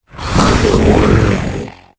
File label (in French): Cri; torgamord